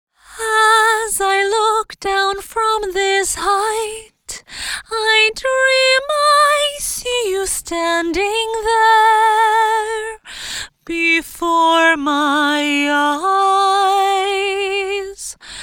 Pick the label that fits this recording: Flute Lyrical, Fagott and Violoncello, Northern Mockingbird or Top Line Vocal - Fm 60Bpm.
Top Line Vocal - Fm 60Bpm